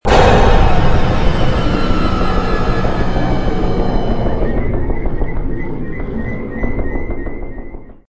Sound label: intro music; vr nalo; cry of fear